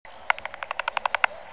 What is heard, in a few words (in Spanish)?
canto Asio flammeus Clap, rapaces nocturnas